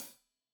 Hat Loose, Hi-Hat, Cymbals Stereo

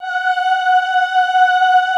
AHH F4, choir; Best Service; Extended Classical Choir; AHH MIX

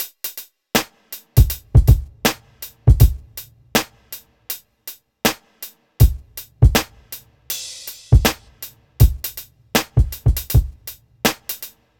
Drums, Hip Hop

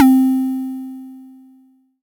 SY TAMBI; ModelCycles

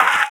Paper Fx; Sonic Foundry (Sony Creative Software); Esoterik Beatz

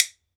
WOOD BONE, Celtic Percussion; E-MU Producer Series Vol. 7 – Old World Instruments

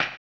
HAMBONE, Vocal Blues Soul; Spectrasonics; Planet

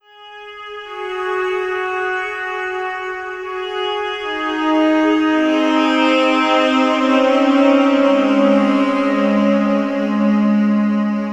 Sax; Sonic Foundry (Sony Creative Software); Slow Silhouette

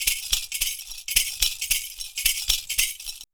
PERCU; Univers Sons; Raricussions

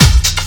COMBI, Houseworx; Ueberschall; Workz-9

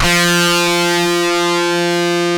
rock F2, rock guitar; InVision Interactive; Lightware VOL-1; Instruments & Percussions

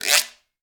guiro stroke, percussion; ILIO; Synclavier Percussion 2; World & Orchestral

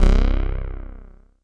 JUNO BASS

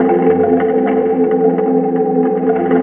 amb f, Ambi Drones, Chillout; Best Service ProSamples vol.36

